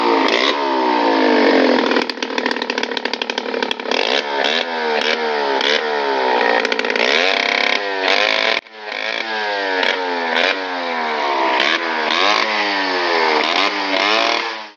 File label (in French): La mobylette